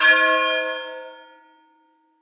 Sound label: steel; cccbr; glossop steel waddesdon 2nd